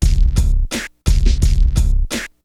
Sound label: LOOP, Hip Hop; InVision Interactive; 40 Oz Phat Slammin